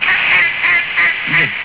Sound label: quack